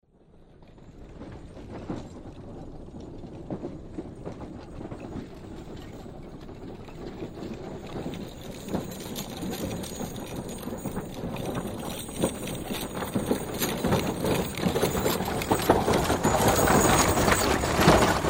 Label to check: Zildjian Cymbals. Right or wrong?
Wrong